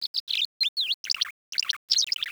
Tree Swallow twitter

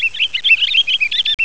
pine grosbeak

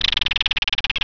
Belted Kingfisher

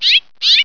housefinch call

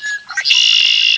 red wing blackbird

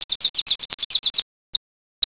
chipping sparrow

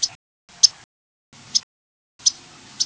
Yellow Warbler call

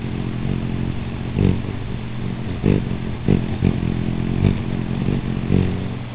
rubythroat

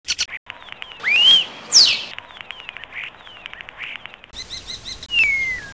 starling

